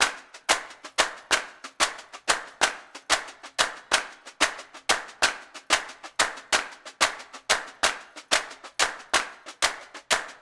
Claps and Caixa 184 bpm; Loops; Drums; Percussion; Ableton Live 12 Lite; Core Library

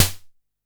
Snare, drums; Ableton Live 12 Lite Core Library

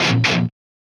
Muted Chugga Stab; Sony; ACID Pro 7.0

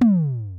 Tom Low Pitch, Drums

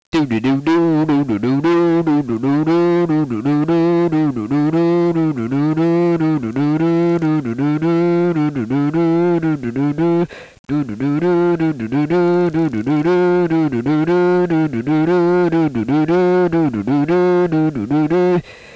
remix Guitar

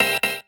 Stab Synth Double; One Shots; Ableton Live 12 Lite; Core Library

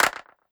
Clap Surround, One Shots Drums; Ableton Live 12 Lite; Core Library

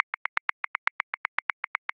Perc Caixixi Accent 16ths; Grooves; Percussion; Ableton Live 12 Lite; Core Library

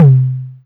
Tom DMX Analog; Drums

